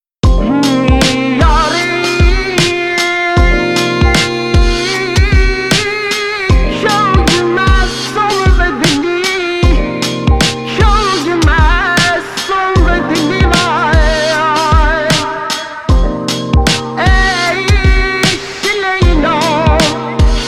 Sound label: FolkTunes